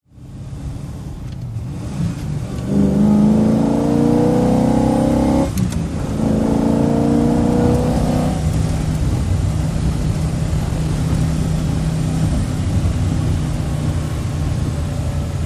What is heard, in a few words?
Idling Accelerates, sports car